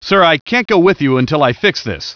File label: duck jump guy